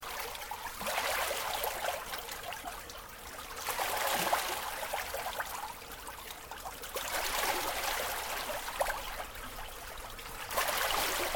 Water Storm Drain Light Flow Waves Loop; Elemental weather effects; Nature

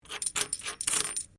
Coin Penny Multiple Move Wood; Common coins; Money; Objects

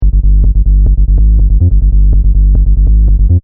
Techno-Trance Sub Bass Loop In G 142 BPM; Bass Loops; Lynne Music

